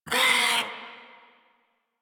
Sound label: Feral Vocal Pain; Warframe; Catbrow